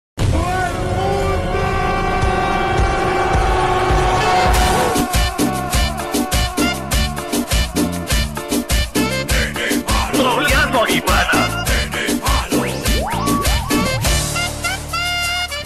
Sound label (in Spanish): musica troll